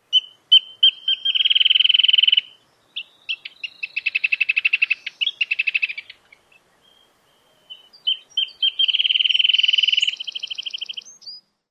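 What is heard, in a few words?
song, Wrentit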